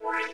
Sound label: restore up; BigMax nº 7